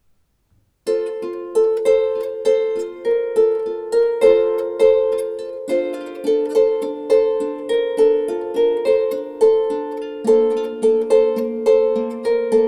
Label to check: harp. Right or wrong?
Right